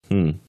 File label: hmm